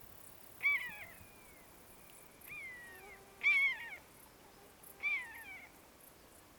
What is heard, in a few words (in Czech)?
káně lesní Buteo buteo